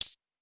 UI Boost Click; we are the dwarves gamerip 2016

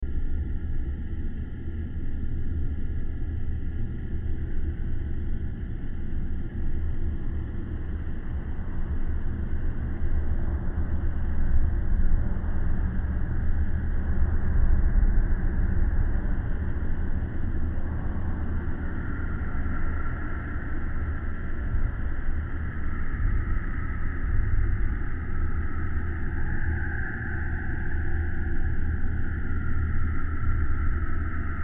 interior Large Space; Bespin

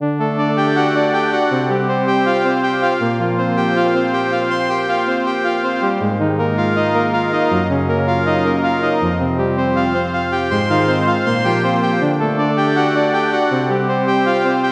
Save Load; Etrian Odyssey III HD

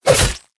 Fight Attack Sword; Mighty Party; Android

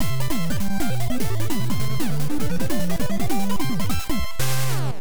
Stage Clear; Kira Kira Star Night DX; Family Computer

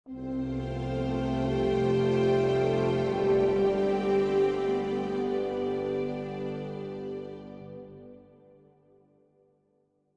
Jingle Celebrate; theHunter: Call of the Wild

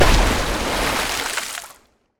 Big Water Splash

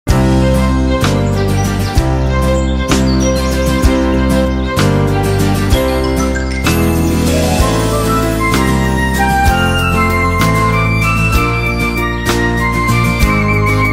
Forest Song; End Credits; SimAnimals Africa; wii

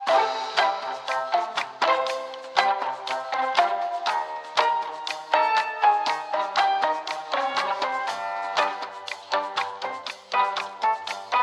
Elevator Loop; Steamworld Quest Hand of Gilgamech; nintendo switch

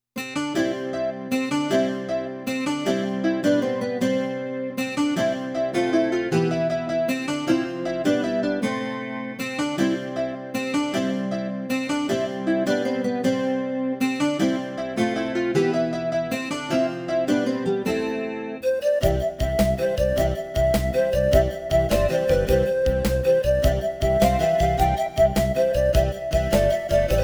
Hymn; The Legend of Heroes IV A Tear of Vermillion; ibm pc gamerip 1996; Plateau Hymn

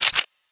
Weapon Reload

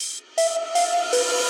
Boss floor intro

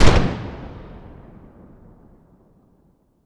cannon fire single shot blast explosion

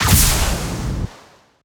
Gunartl Rocket Launcher Fire

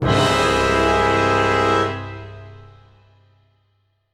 Brass Hit; ghostbusters gamerip 2016

